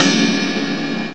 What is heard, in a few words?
RIDE CYM; CYMBALS; M8; CMI Factory